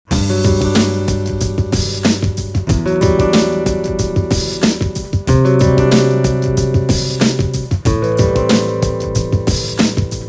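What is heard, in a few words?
acoustic groove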